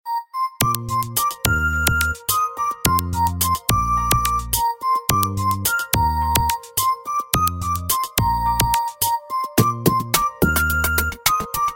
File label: Melody; WAO; 5.0-LTE